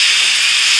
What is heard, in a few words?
Ouch!; Emmitt Smith Football